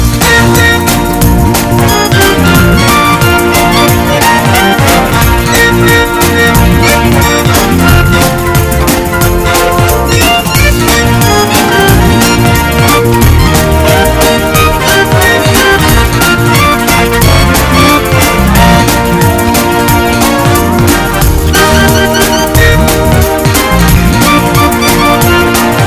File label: A Fast Gospel Shuffle